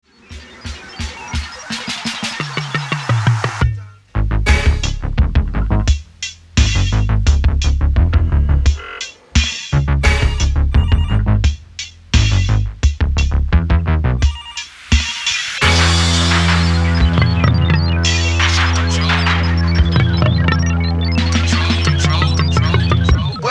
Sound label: Digital Reggae Remix